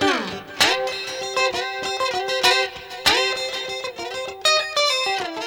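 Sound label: TOWNSHIP, S. AFR. GTR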